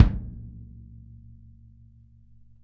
kick, Drum Kits; Sonic Reality; Interactive Drum Kits; 90s sample cds

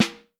Snare Drum; Q Up Arts; Johnny.C's Vintage Snare Drum; 6.5X14

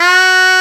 Shenai F#3; Multisamples; Digital Sound Factory Planet Earth

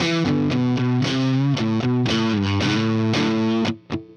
Riff Guitar, Indie Rock; Sonic Foundry; Indie Rock Anthems; Haze

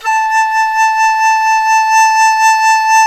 dizi A3; INIS; Opium

